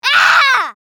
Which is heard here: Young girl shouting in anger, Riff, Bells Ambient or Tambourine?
Young girl shouting in anger